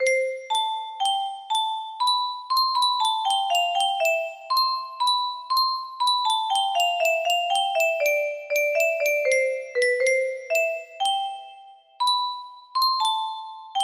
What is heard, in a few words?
melody, music box melody